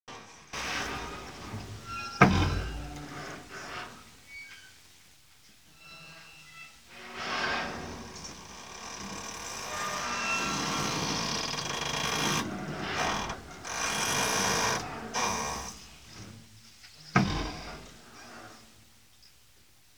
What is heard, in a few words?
Knarrrrz